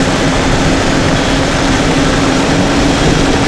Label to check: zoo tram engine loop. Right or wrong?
Right